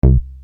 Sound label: bass